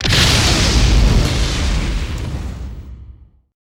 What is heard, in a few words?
ROCK LAUNC, JET FIGHT; Total Control - Action Cutz